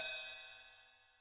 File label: Ride, 135bpm; Zero-G Sound Sense Grime Scene; Construction Kits; A Positive Vibe